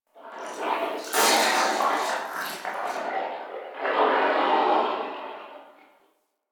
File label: Digest Interior Small, Ambience